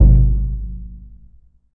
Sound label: Surdo; E-MU Producer Series Vol. 2 – More Studio Essentials; Composer; Surdo Pallet